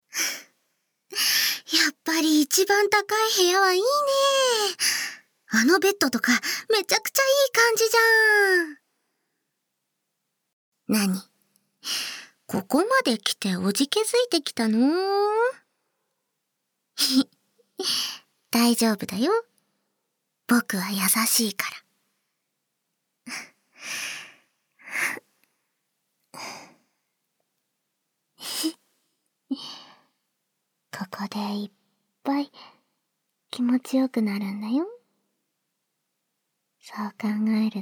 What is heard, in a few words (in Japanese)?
シャワー